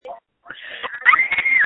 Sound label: Scream